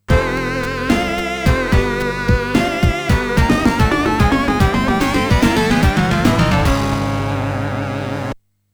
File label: game over; sonic 16bit